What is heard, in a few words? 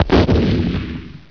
Blast